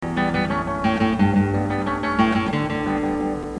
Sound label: Bluesy